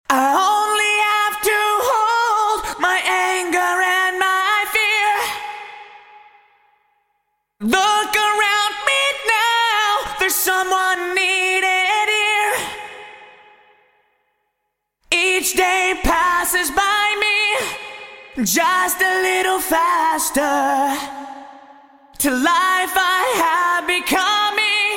Vocal Yamaha SPX900 Hall Vintage Digital

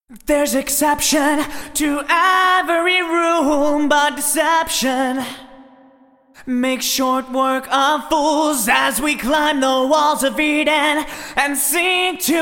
Vocal Dry

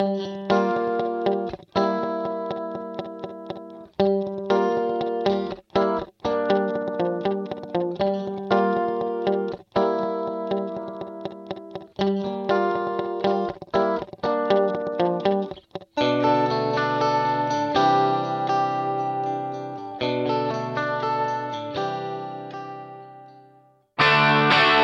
Guitar Yamaha D5000 Deep Flange Vintage Digital

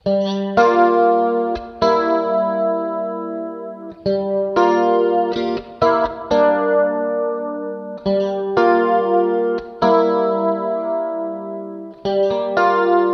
Guitar Chords Wet

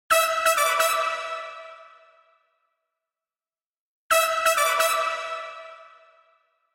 Brass Bricasti M7 Deep Chamber Vintage Digital

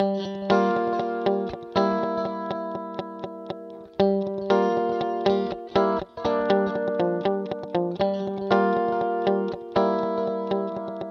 Guitar Yamaha D5000 Guitar Delay Vintage Digital

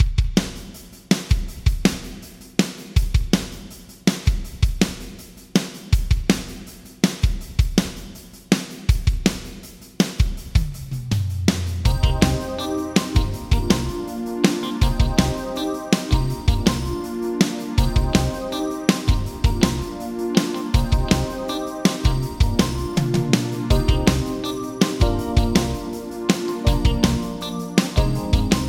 Drums Yamaha ProR3 Medium Hall Vintage Digital

